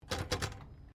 Typewriter Remington Standard Space Bar, Old typewriters